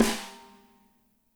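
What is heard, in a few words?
snare left; Spectrasonics; Burning Grooves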